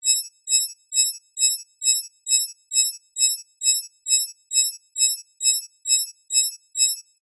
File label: science fiction computer scanning tone